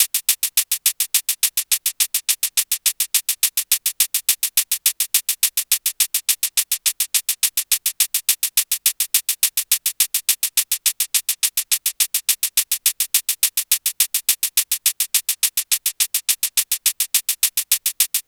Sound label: hat 105, Drum Loops, Hat Loops; SD MIDNIGHT Sample Pack; Slate